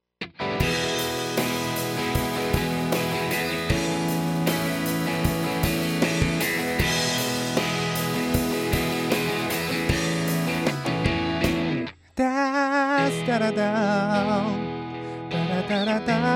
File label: no re guitar